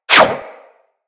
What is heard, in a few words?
Bullet Parry Metal; Impacts; Bullet Parries